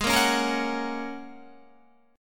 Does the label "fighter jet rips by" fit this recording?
No